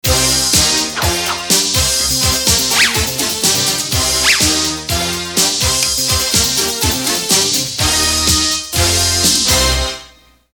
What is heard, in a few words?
music slimer